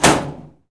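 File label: door metal open; The Elder Scrolls III - Morrowind; Morrowind GOTY GOG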